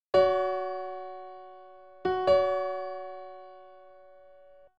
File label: Wolf fifth